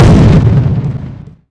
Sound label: rocket; weapons